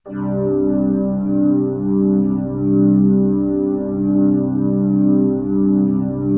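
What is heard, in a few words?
chord pad, Pads; ATMO PADS; Best Service; XX Large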